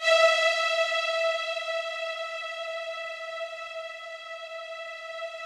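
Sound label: Yamaha VP-1, rough strings E4; 90 s Sample CDs; Music Store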